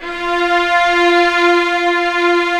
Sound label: STR SEC 6, Strings; AKAI S6000 CD-ROM - Ultimate